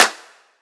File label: clap lofi; Drum Sample Pack; One Shots; Claps; Slate; SEISMIC